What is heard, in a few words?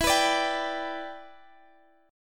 G Major E, chord; strummed